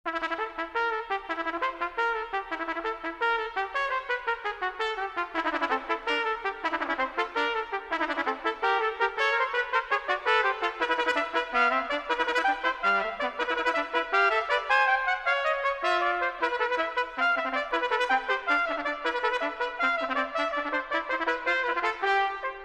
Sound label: Duets, Fanfare, Trumpet